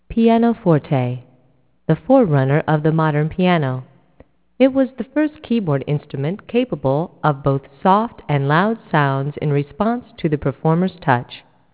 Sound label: lmg piano